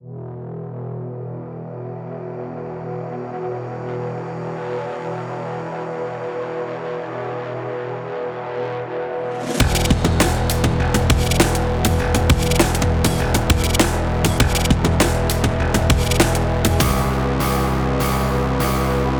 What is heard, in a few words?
Fight Start; evacuation; windows gamerip 2023; River